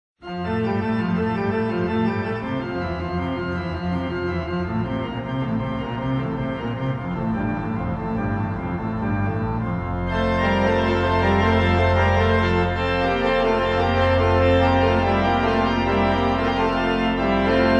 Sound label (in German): Orgelnachspiel